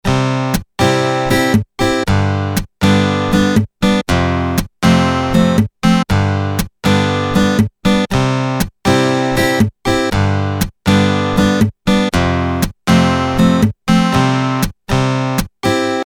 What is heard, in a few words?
Western